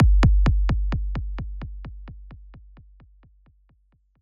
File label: delay